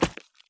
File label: sprint gravel; Escape from Tarkov